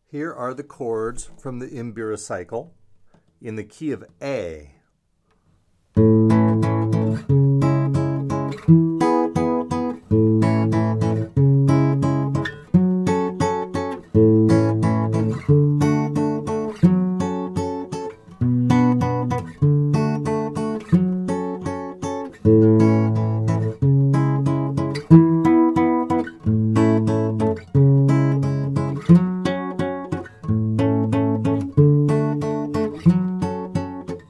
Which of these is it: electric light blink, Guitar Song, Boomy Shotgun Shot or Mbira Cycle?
Mbira Cycle